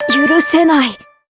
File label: surprise; gotts